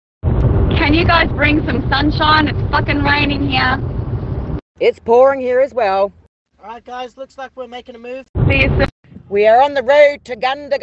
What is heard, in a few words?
raining